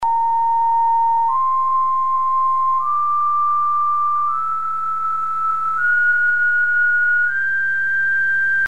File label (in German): 7. - 12. Partialton; Mikrobuch